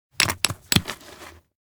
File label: chisel strike; anemoi